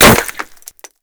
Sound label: impact masonry; Modern Warfare